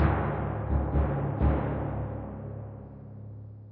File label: Tymp